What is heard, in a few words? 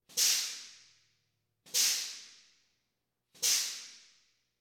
Police, Fire engines